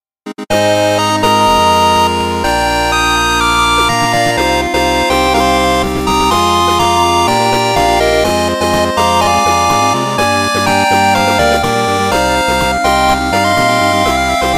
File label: chip